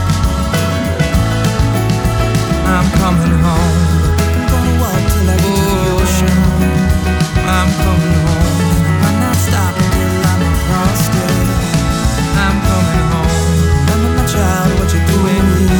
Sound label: piano